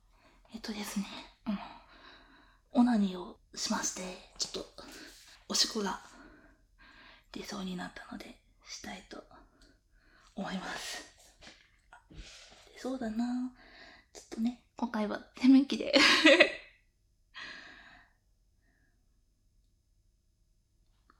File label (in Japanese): おしっこ 室内 バイノーラル